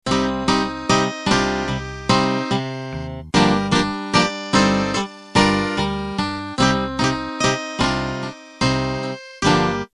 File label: 8Bt Standard End